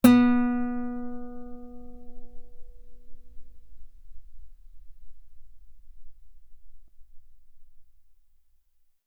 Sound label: Guitar ff sul B B3